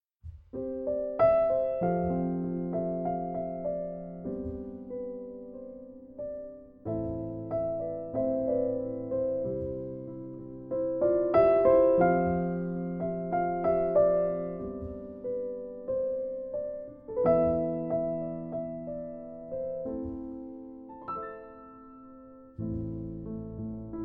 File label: Piano Version